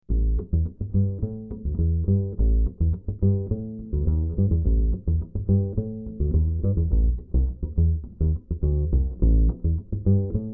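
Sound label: acoustic bass Plucked direct input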